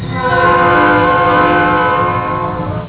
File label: diesel horn